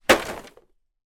impact box full of plastic light items kick or drop